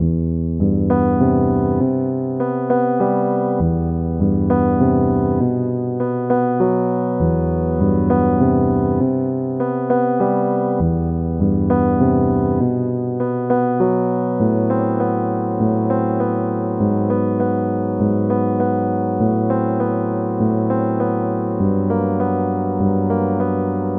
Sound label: Piano